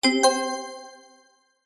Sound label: mobile game warm collect item